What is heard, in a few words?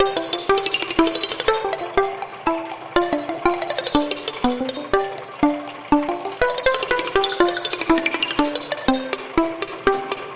Teacups; My Tamagotchi Forever; android; GSFX Teacups